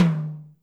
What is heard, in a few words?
drum tom hi soft